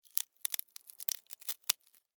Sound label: Termite, Bite, Wood, Splinter, Crack, Insect, Bug; Termites; Sound Effects:Animals:Insects; Blastwave